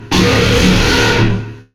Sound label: death